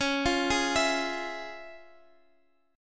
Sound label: F Minor Major 7th Sharp 5th, Db